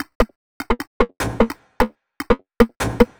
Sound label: 150 Krisp N Dry Perc, 150bpm; Combi Loops; Nu Dance Electro